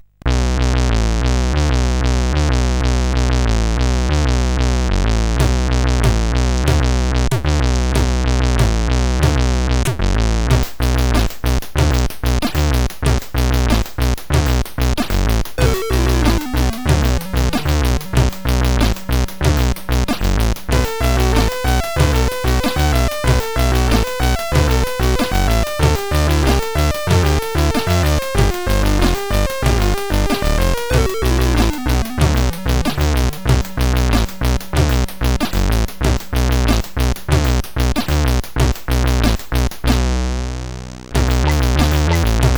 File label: Second Shower tune,sid CSG8580R5